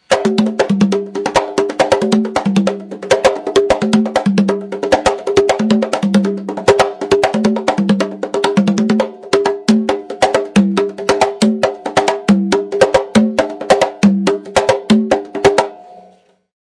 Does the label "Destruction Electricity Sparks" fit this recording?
No